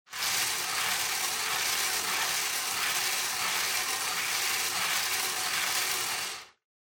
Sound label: Bingo Machine, Spin Fast